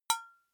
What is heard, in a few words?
glass metal small impact